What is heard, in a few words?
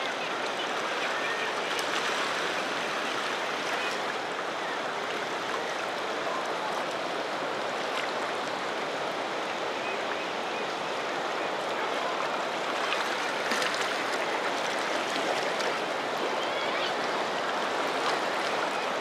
ocean